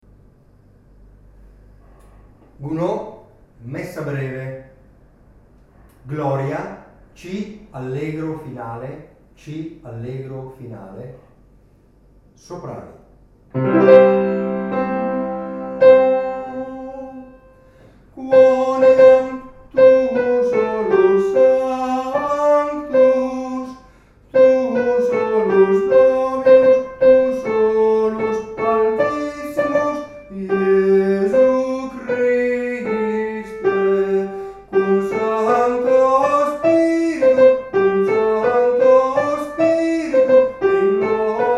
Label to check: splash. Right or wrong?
Wrong